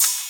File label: short open hi hat sample g sharp key; open hat samples